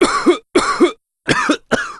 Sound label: heavy cough